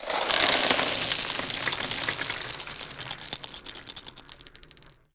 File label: marbles